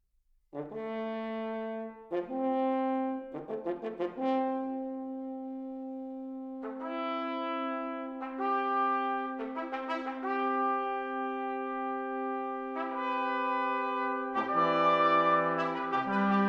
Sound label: Brass Quintet Preludium